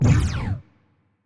weapon laser muzzle; biogenesis; confuser

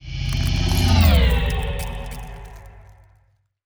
pro ship juggernaut move; biogenesis

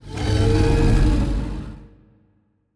kaiju enrage; biogenesis

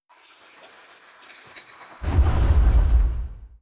distance crystal ship large death; Stellaris; other entities

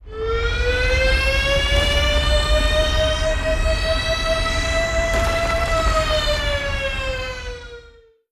air raid siren; Stellaris

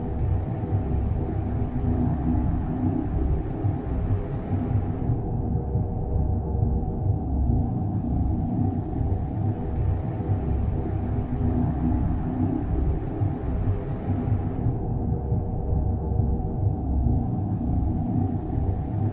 ship colossus idle; Stellaris; machineage; ships

